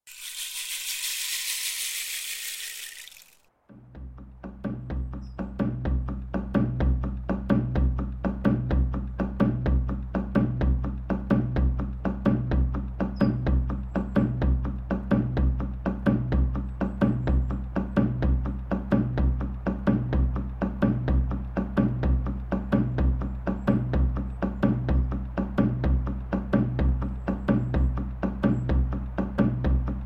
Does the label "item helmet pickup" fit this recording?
No